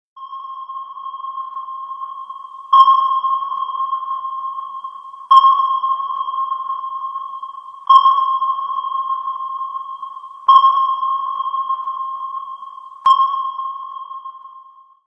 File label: submarine sonar sound